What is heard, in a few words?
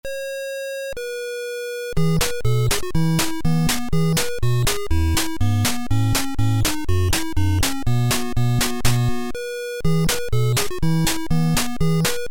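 Tetris melody on the Dendy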